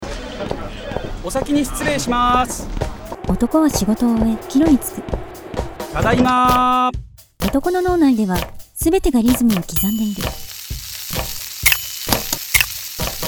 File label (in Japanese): リズム篇; yamaha otorent Spotify